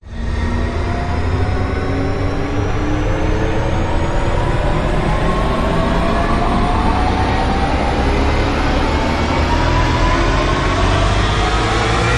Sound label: Rise Long Take Off